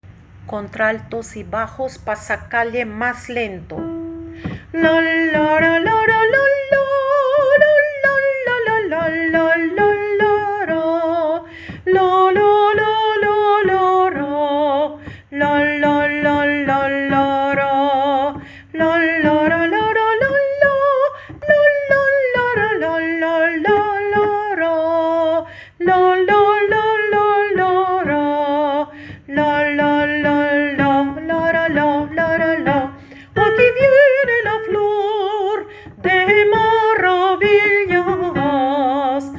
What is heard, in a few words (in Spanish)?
Pasacalle ContraAltos Bajos